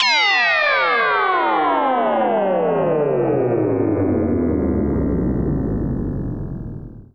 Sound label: Downlifter